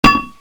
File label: freeze bounce, weapons